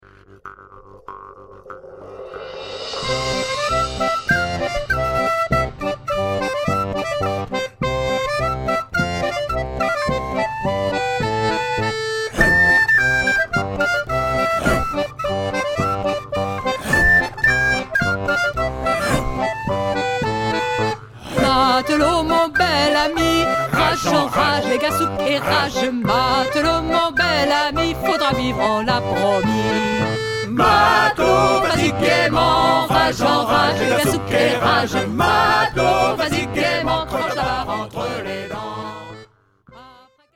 chant pour le depart

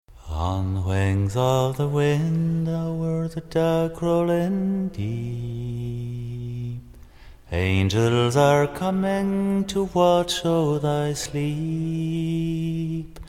berceuse; hear the wind blow

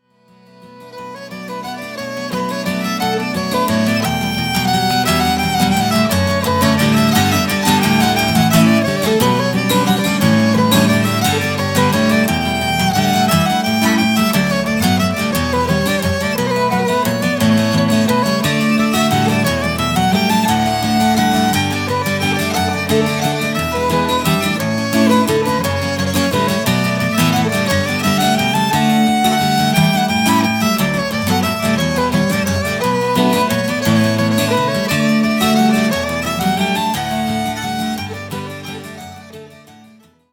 valse

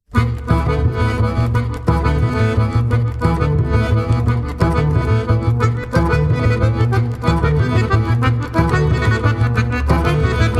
caprice diatonique

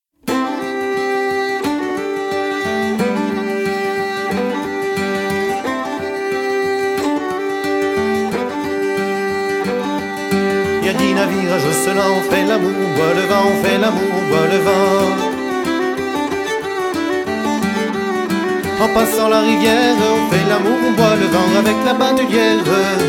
rond de saint vincent

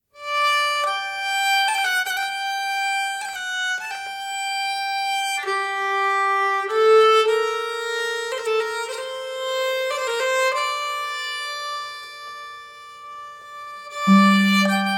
valse

